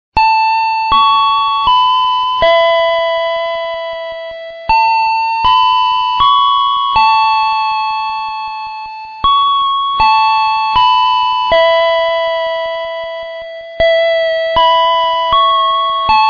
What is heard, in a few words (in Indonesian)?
kereta api bel stasiun kereta api indonesia